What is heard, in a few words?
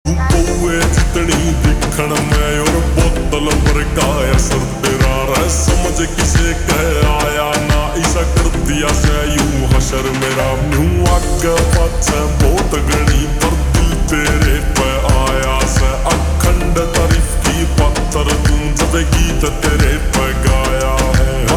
Ringtone Slowed Reverb